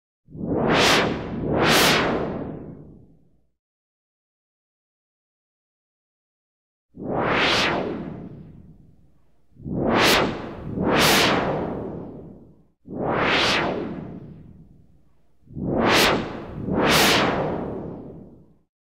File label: Woosh